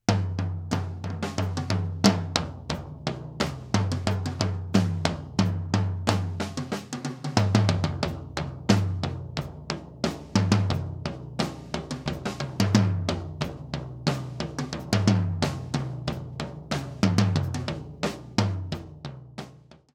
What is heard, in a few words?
Tom; hihats